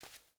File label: footstep burlap concrete walk; Rust